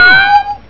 AHOW, effects

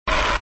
skate; scoobybigair

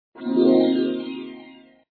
clear Item; run

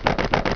Bat; IQ2 Demo

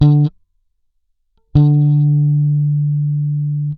Bass Oct D, Standard Bass, Fingered; Future Music

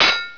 Sword Clang; Castles - Siege and Conquest

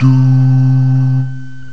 voice oohs, ensemble; gmplay

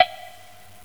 Wood Block; Percussive; GMTones

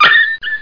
dog yelp; Duke Nukem 3D Kill-A-Ton Collection

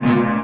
blade; Starbound II

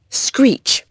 Screech

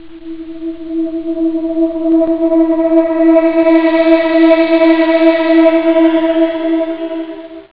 stellar, whoosh; da capo vol1; instruments

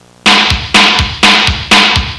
drumming; Sound Library 2000; Wayzata Technology; Music In

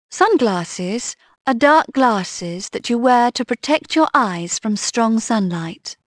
sung; Dorling Kindersley My First Incredible Amazing Dictionary

